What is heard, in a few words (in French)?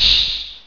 vapeur; fortress